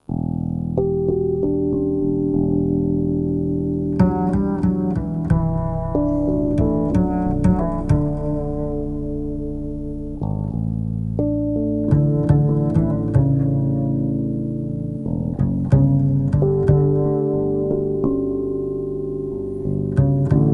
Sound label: Ambient; Cottonland soundtrack